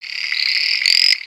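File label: Pets Insect Large Angry, creatures; Lego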